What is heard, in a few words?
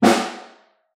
SN Far, Snare